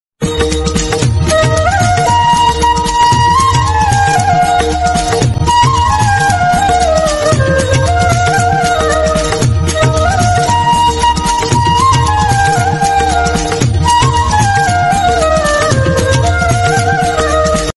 hanuman chalisa ringtone instrumental bhakti ringtone